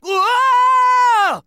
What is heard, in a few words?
scream; sonic unleashed